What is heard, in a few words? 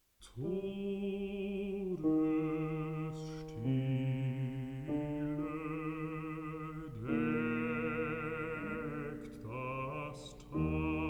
song for voice & piano